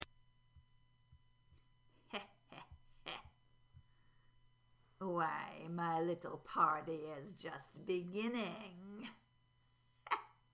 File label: heh heh heh